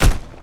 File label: scrapjack footstep; The Monster Plane; ss3